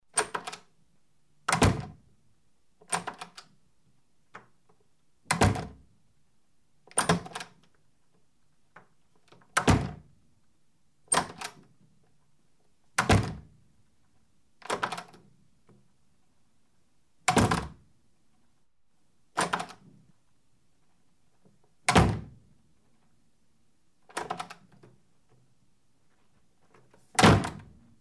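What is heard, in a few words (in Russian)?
деревянная дверь различная бытовая защелка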